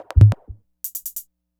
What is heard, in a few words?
Percussion, Trance NRG, Drums